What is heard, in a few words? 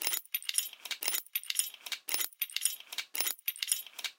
chain move